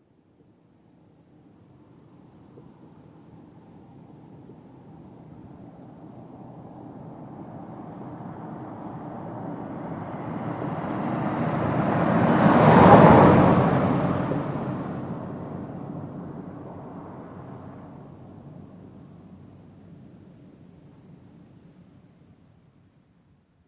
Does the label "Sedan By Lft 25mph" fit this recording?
Yes